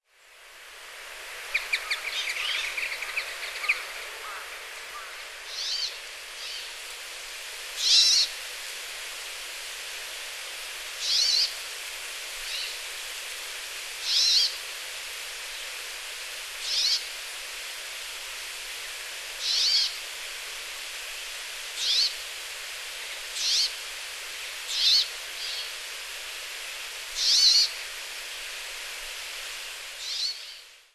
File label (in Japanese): atori, okayama, koe